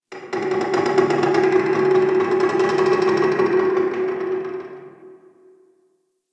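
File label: Metal Creak Distant One Off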